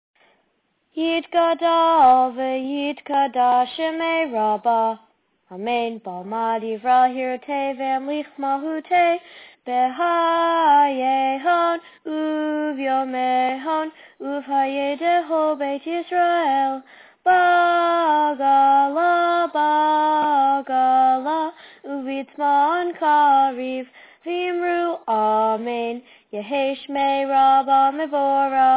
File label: Chatzi Kaddish